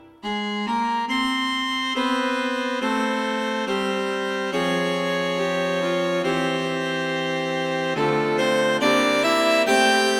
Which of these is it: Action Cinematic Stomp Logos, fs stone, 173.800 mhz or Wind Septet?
Wind Septet